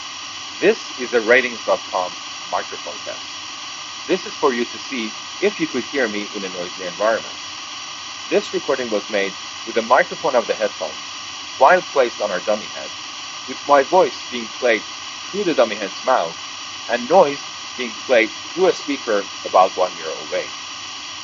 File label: noise gate low noise